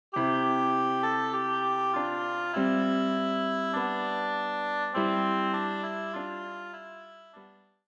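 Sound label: oboe; Alle Jahre wieder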